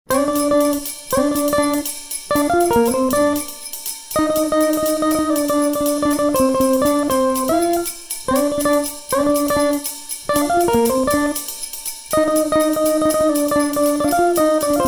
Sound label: Jingle Bell Jazz; Soundzabound Volume 2 Disc 1